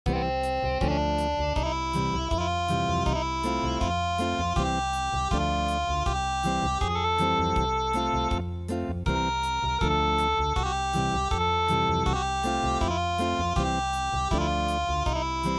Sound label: Unplugged